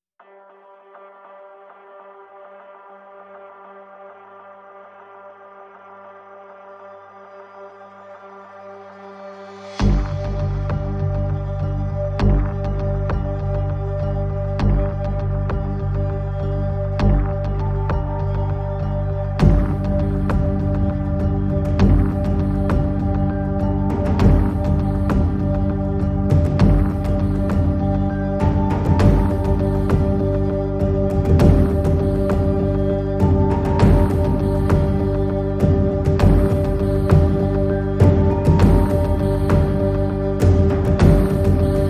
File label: No Strings